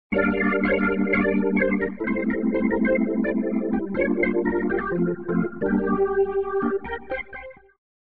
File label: Rotary Speaker Vibration Waves Organ Wet; AmpVibe; Syntheway